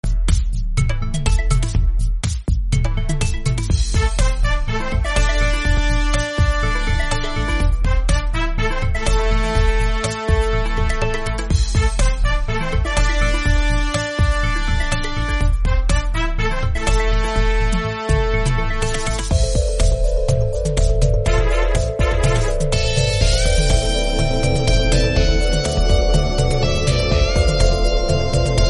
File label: instrumental bgm; cbi 5